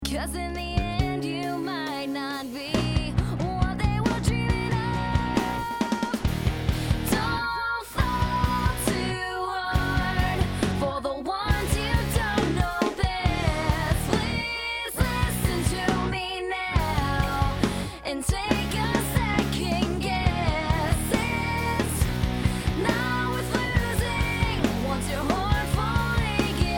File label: harmonies